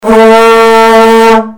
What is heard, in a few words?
vuvuzela